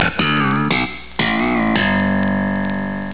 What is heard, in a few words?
Bass riff